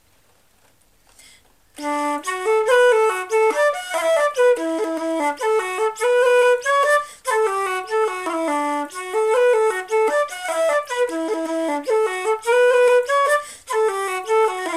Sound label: Reel, slow; Quinn's